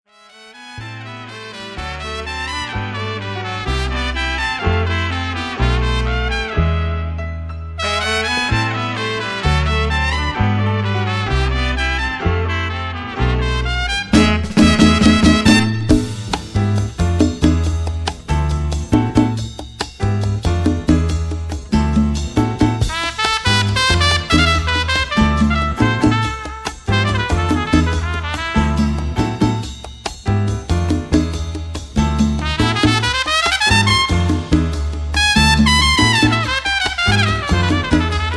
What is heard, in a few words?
Latin Jazz; Putumayo World Music